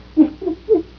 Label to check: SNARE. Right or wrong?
Wrong